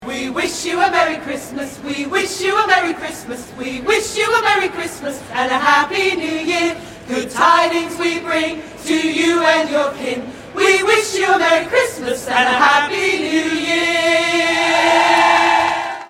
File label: choir; Heathrow